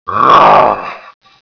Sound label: baby garg attack